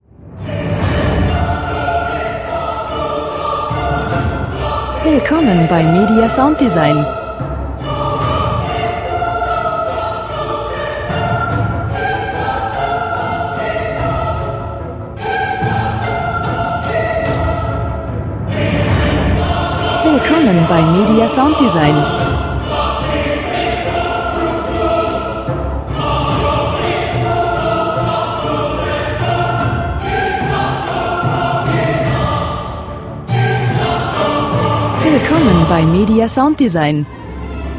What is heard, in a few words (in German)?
gesang bonum est confiteri domino